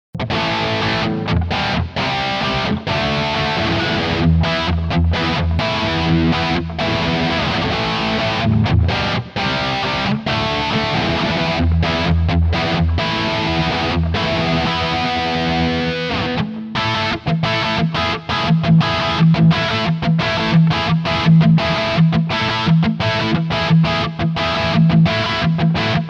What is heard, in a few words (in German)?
Les Paul Modern Steg Pickup Highgain Rhythm